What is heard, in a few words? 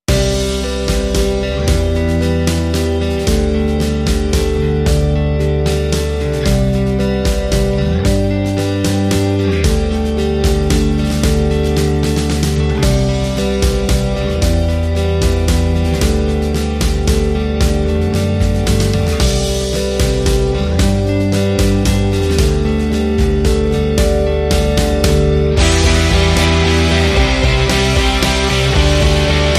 Underscore